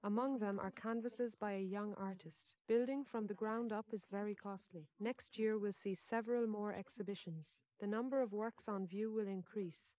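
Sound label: female, instantaneous mixture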